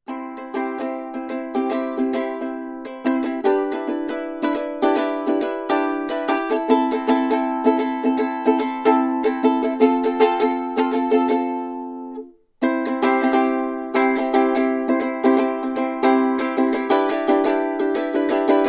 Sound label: Ukelele